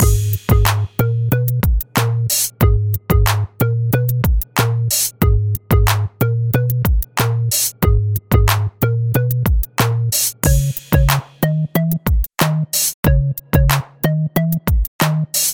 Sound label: slow style